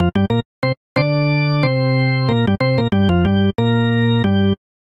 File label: Phrase R&B Organ G Minor 98 bpm; Electric Keyboards; Ableton; Factory Packs